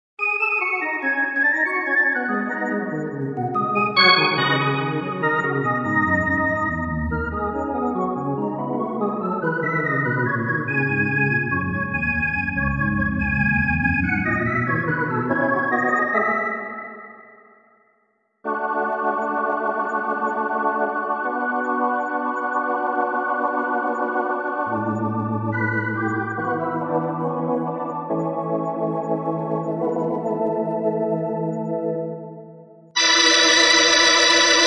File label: rotor organ